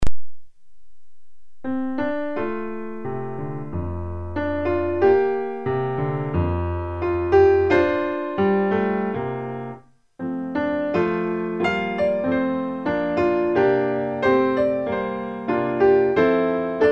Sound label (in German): Melodie Oberstimme